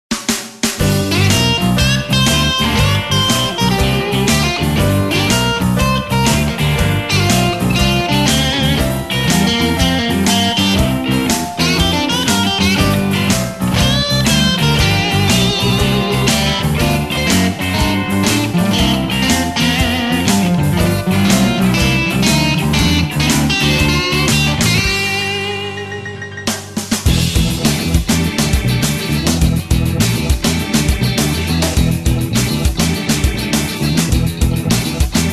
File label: Blues